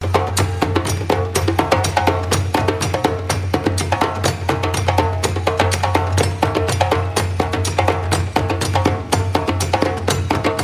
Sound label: Drums